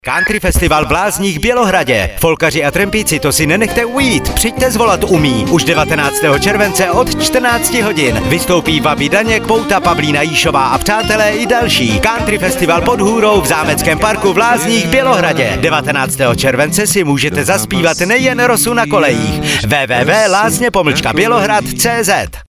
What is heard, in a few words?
country